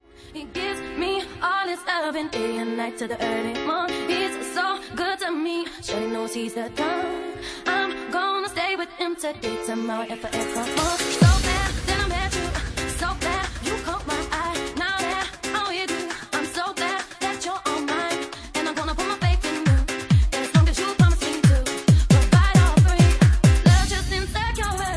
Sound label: Organ 2 Bass Remix